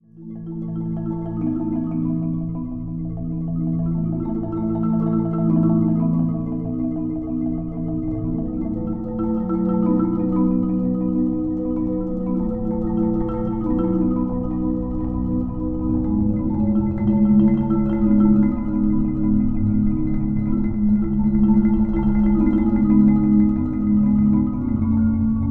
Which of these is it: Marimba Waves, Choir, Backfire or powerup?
Marimba Waves